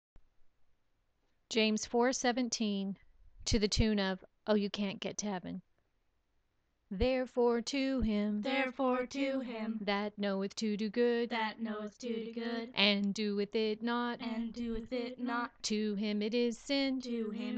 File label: song recording